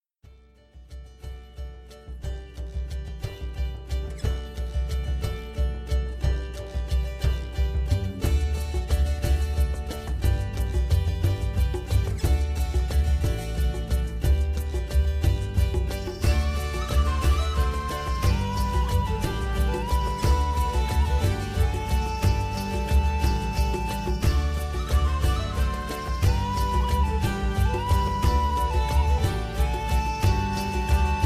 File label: harbor, ambience music; PhoenixCraft 1.16.5